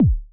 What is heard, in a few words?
kick, Roland MC-909